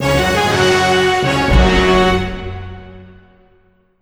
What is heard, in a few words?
Victory Music Win; gamerip; Hero Defense Haunted Island